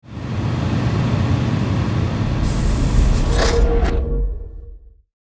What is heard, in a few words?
Spaceship alien craft take off